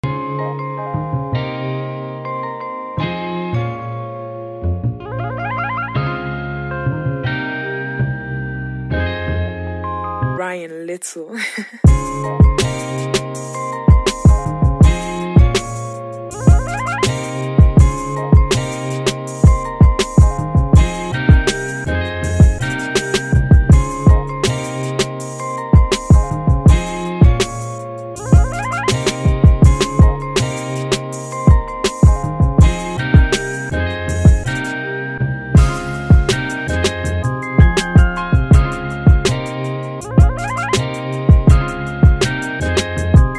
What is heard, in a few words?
70s tv background music